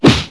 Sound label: whack; Wired for Sound Pro CD; Aristosoft, Inc.